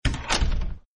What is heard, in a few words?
door close sound